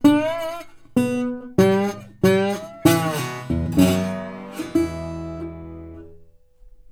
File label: Blues Guitar, LICK; ILIO; Fretworks; SIMMS